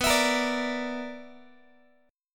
B-Minor Major 7th Double Flat 5th, Guitar; BmM7bb5; strummed; x,19,19,20,18,x

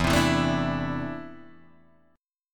E 7th Suspended 4th Sharp 5th, Guitar, DADGAD

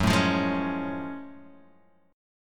Gb Minor Major 9th Gb, down, Guitar, Open D

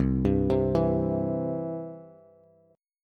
C Major C down Bass; arpeggiated; chord; JGuitar

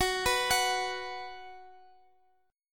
B 5th Gb, chord

